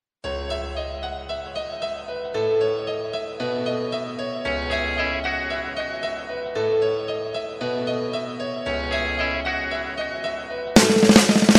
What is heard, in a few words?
Piano Sad